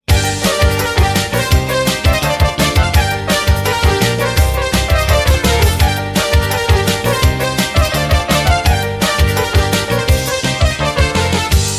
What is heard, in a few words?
ringtone pop rock